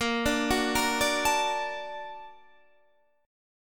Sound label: A sharp Augmented Major 7th A sharp Guitar Standard; arpeggiated; JGuitar